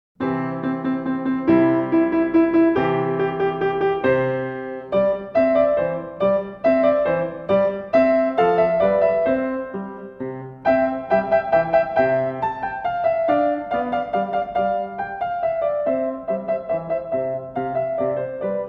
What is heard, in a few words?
Trumpet Tune; Magrath Book 01-02